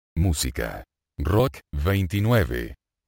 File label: rock